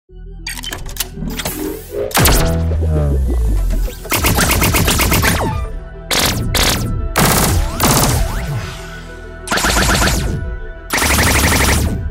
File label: Future weapons